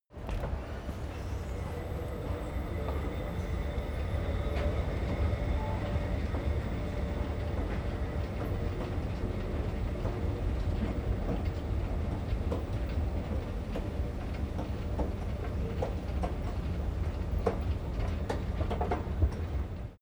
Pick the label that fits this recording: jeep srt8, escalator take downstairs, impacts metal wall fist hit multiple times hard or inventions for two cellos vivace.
escalator take downstairs